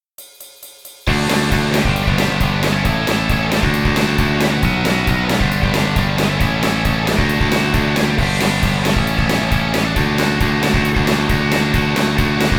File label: swing